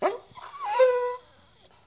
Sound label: Dog Idle; In the Line of Duty Firefighter